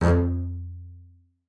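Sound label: marcato F2 left, bass spiccato; Xsample VOL-5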